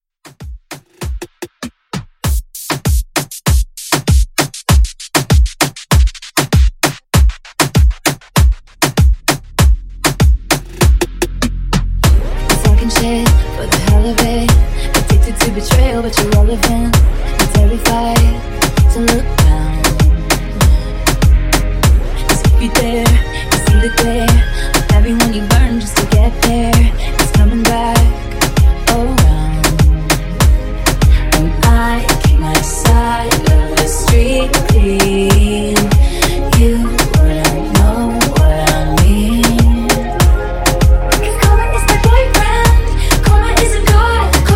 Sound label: Reggaeton Redrum; Remixfreakz